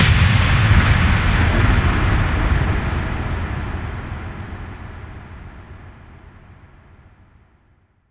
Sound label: Bassdrums FX, FX Kicks; Vengeance Samples